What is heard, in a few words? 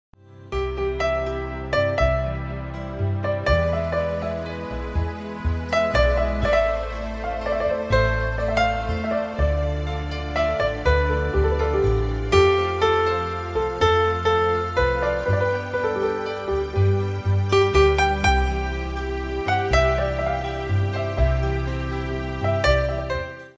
instrumental ringtone